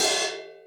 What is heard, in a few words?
Crash Mute